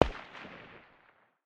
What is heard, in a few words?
ak 556 far distance, 5.45x39mm; tacz-tweak-pack; taczmechanics